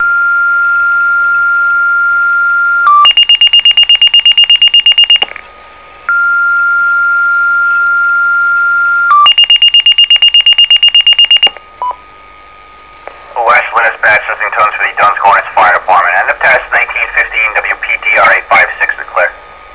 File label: tones